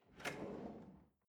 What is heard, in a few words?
drawer large trash draw short